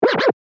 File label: Scratch